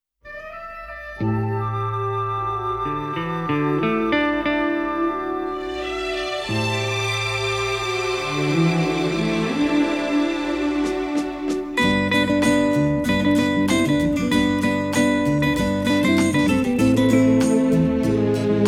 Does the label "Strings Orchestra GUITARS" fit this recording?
Yes